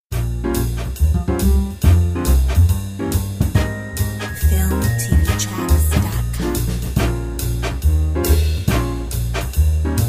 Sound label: Cool Jazz Club